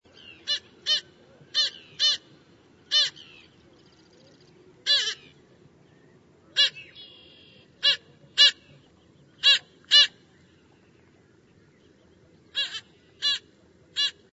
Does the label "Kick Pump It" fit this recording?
No